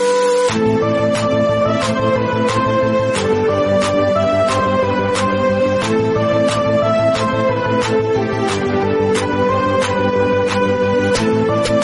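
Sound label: Love background music Telugu Ringtone; Dhanush; 3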